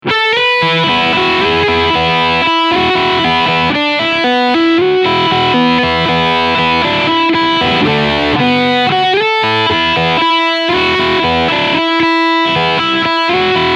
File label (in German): Fender Vintera 70s Tele Deluxe Overdrive Riff Steg PU